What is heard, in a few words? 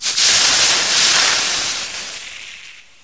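steam fx, air gas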